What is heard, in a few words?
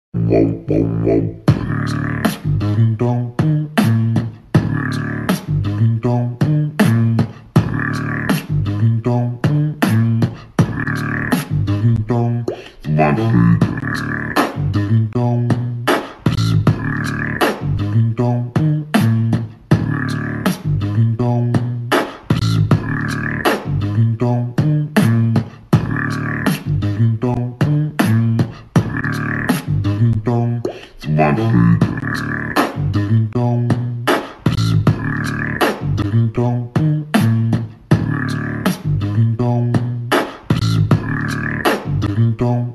Beatbox (Slowed)